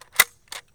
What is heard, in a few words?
EJECT; Deadly3D; E-MU Producer Series Vol. 5 – 3-D Audio Collection; 3D Percussives